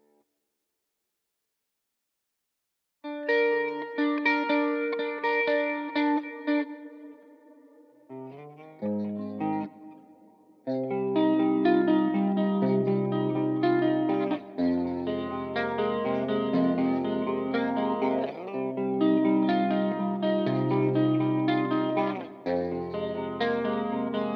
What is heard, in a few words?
Guitar